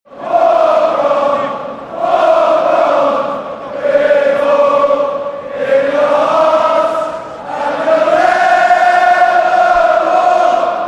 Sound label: Kop Chant